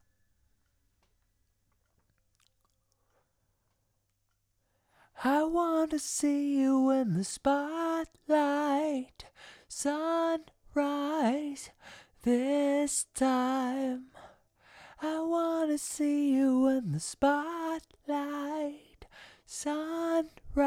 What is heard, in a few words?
vox